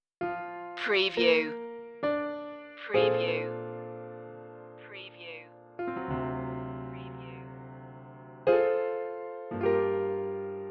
mellow jazz piano

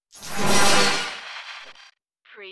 starship door error

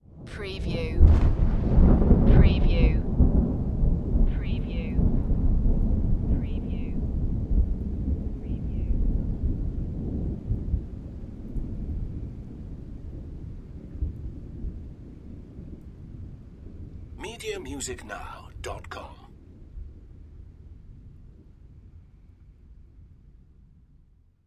Thunder Crack Roll

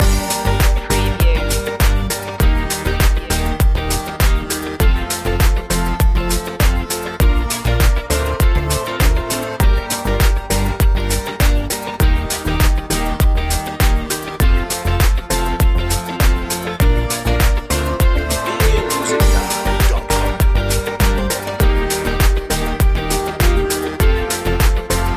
funky poptronic loop

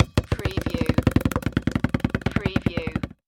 Basketball Dribble; sound effects bible

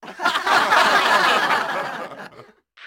Crowd Laugh

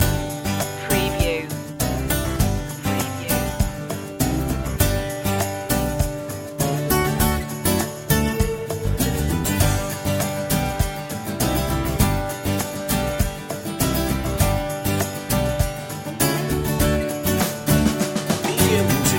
power of now loop

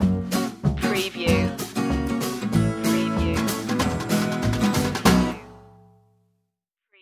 rhinestone rambler stinger

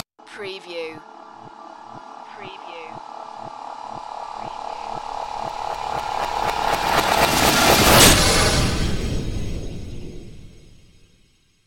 chaotic whoosh impact

